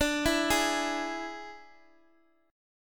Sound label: D Suspended 2nd Flat 5th D, Guitar, Half Step Down